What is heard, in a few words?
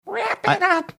attempting to sing wrap it up chipmunks like voice